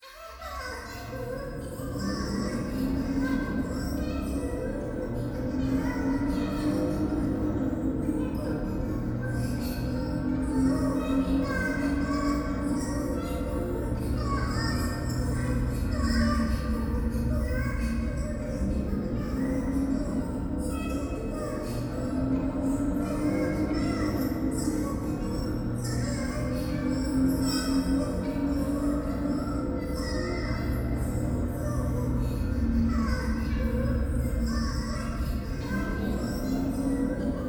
トイレ 可愛い喘ぎ 車の中

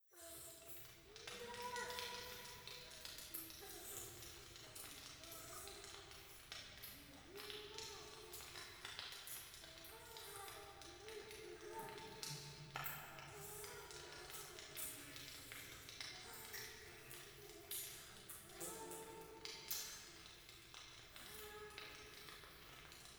風呂 イラマチオ 暖炉, ASMR

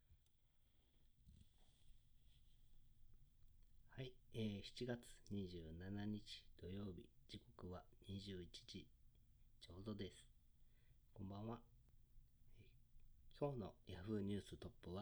耳舐め奉仕; 貞操帯管理3日目

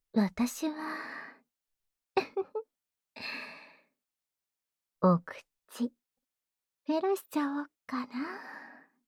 妖艶 ねっとり口フェラ; フェラ素材

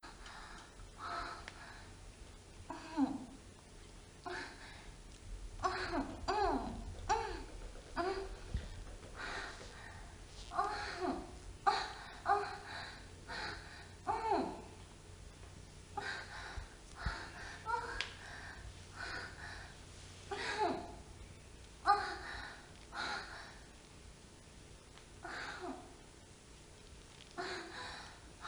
滅茶苦茶エロい声だして喘ぎ狂う 若妻; キッツキツの尻穴を拡張される若妻

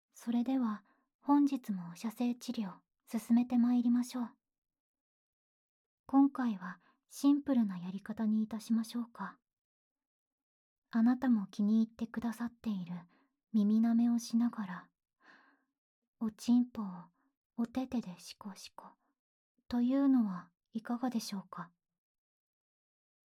耳舐め手コキで治療します

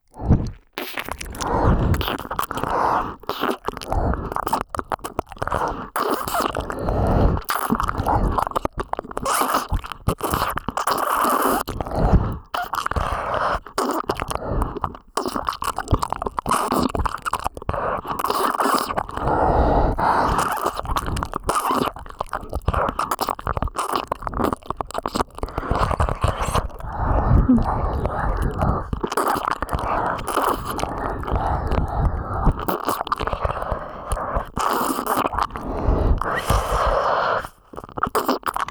脳バグ耳奥舐め（右）ループトラック, ASMR

